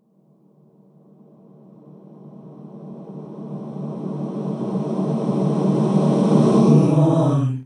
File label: Intro 130BPM FX